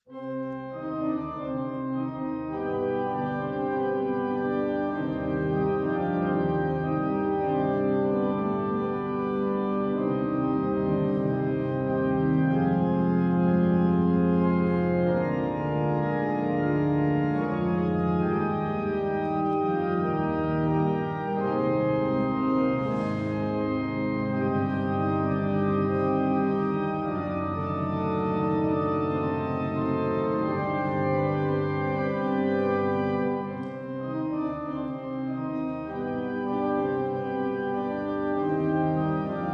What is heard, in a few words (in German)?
Orgelausgang